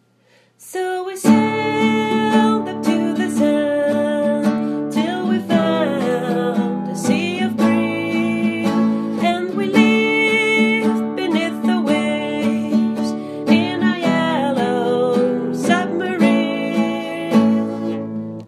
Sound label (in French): Couplet Chanté